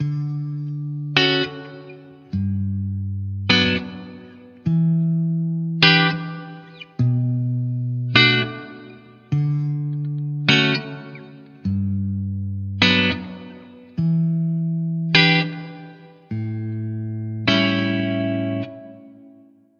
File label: Jazz R B Guitar Loop